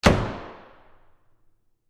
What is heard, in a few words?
Door Close Closet, Foley, Door, Close, Closet, Door, In, Gym